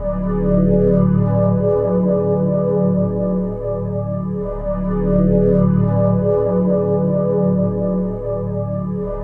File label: Iceplanet, Pads; Sonic Foundry (Sony Creative Software); Synchro Funk